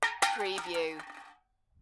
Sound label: Metal Can - Empty Beer Can Thrown On Rocks Settle